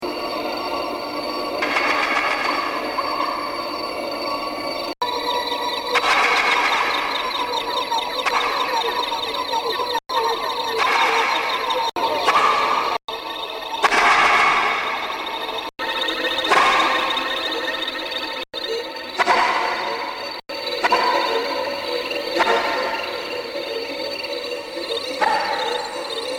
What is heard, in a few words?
golf